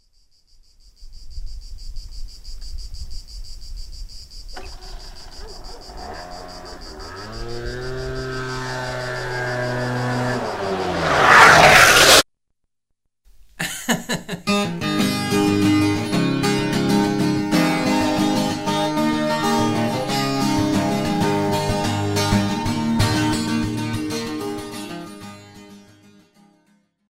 wasp, Olofonia

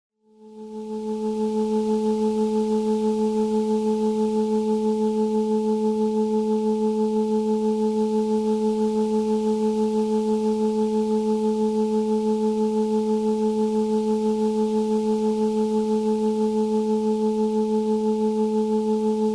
theta isochronic

